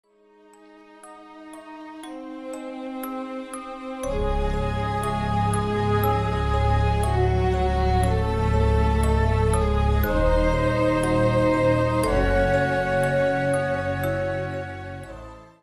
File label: melancholia music box sad creepy